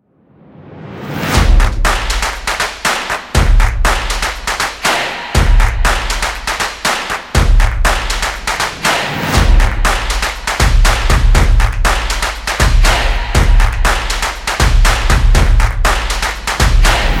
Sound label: Percussion Action Intro; energetic